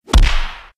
punch

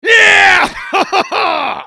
razor; quake 3

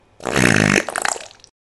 fart; from portugal

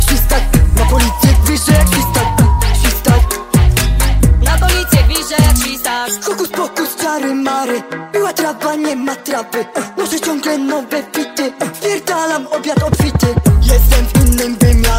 nightcore

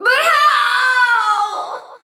femme fatale scream; soul calibur v

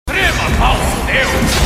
echo

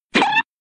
cartoon pinched or pricked

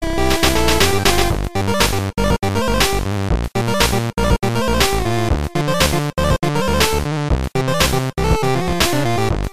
8 bit nes version

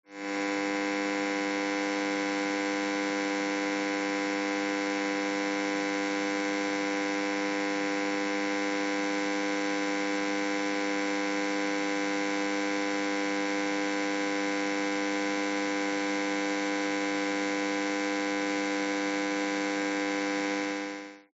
electric

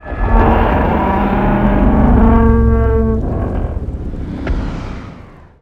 godzilla he whines

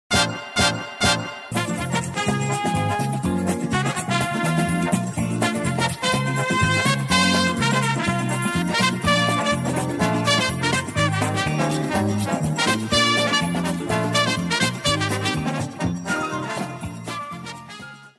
upbeat music cue

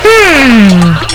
hmmmmmmmmmmmmm

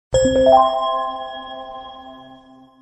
alarm notification

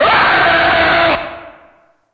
jumpscare sound; one night at dekart

